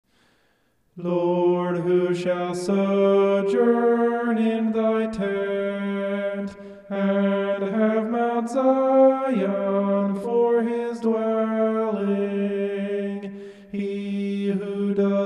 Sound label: vocal homoph; psalm 15